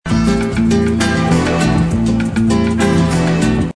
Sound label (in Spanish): ritmo guitarra; Canto